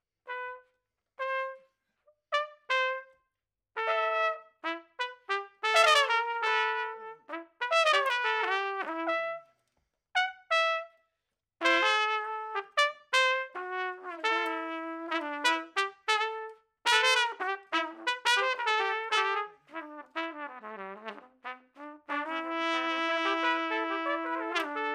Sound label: Trumpet Room